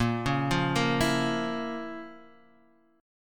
F Major 7th Suspended 4th Sharp 5th A sharp

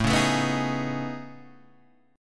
A Major Sharp 11th, Guitar, Half Step Down; AM#11

